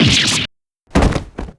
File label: faint